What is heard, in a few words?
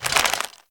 Turn; moul